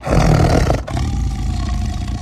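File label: Tiger Growl Low Breath; big cats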